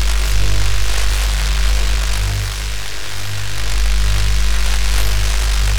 skill bound field loop

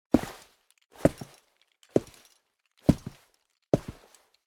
army boots walk

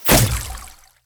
p atk watercannon

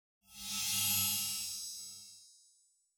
sword

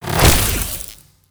elec drill

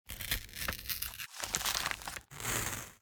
open envelope slow

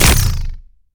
p atk revxbow

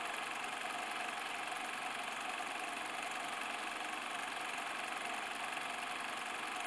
film projection loop

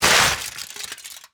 aluminum

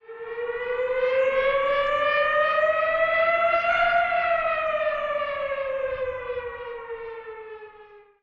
air defense alert

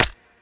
ranged dagger

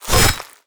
spear

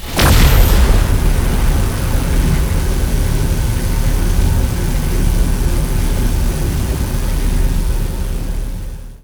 energy beam fire

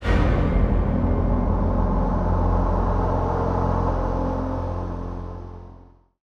aircraft fall

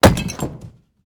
slam wood table

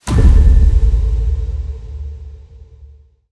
sphere

